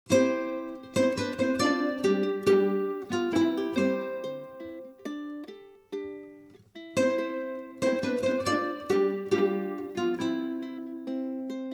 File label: quirky underscore; plucked; Narrative Mind